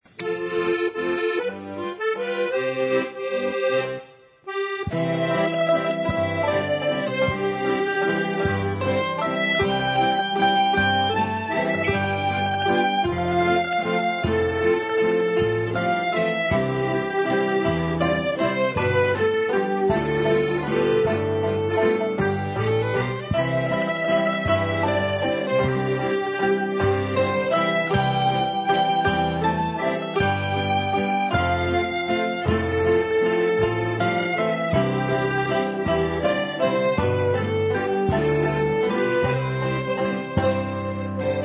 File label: waltz, folk